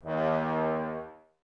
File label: BRS TBS D#, Woodwind Brass Ensemble, Brass; Miroslav Vitous - Woodwind Brass Ensemble